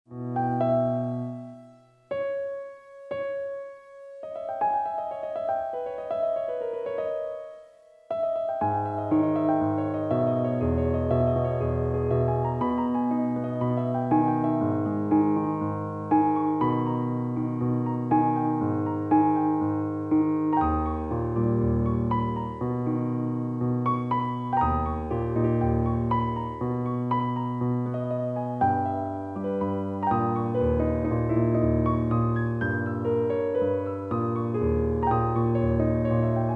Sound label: Piano